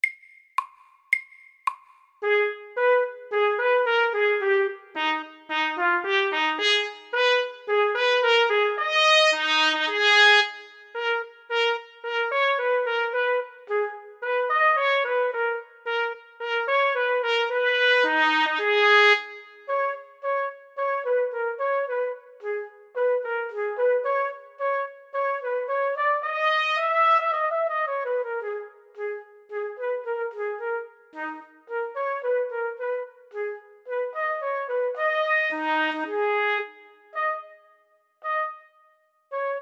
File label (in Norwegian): Etyde i B moll FORT